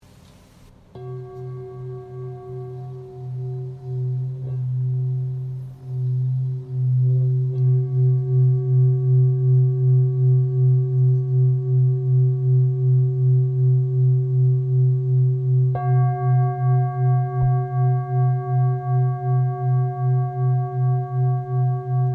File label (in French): Bol bengale do